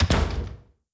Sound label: Door Close; blue archive